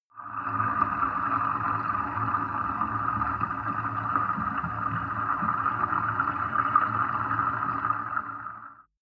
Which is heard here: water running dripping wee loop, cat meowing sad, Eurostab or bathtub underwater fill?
bathtub underwater fill